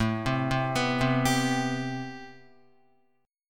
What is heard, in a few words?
A-Minor 9th A Guitar; arpeggiated; JGuitar